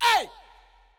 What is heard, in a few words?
Vocal "Hey"